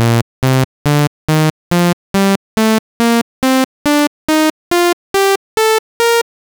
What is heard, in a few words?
Saw Scale; wav2midi